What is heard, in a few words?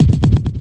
menu hit; o!mania v3 (Bars)